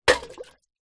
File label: household watering can small metal full of water set down on hard ground; with water splash